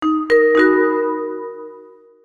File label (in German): bahnhofjingle; sbb